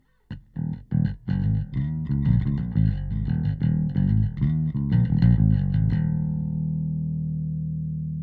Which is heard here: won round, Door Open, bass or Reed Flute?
bass